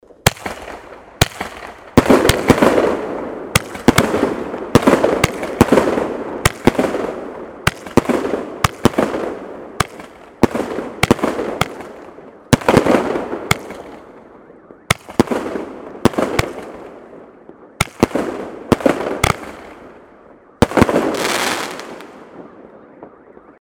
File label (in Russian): zvuk fejerverka